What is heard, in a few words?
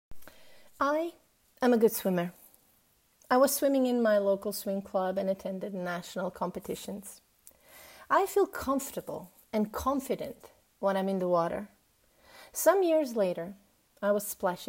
Water